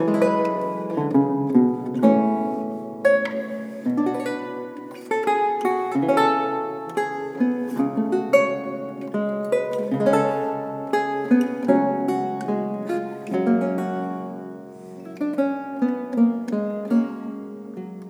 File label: Air, ringtones, Classical Harmonies; Google; Pixel-4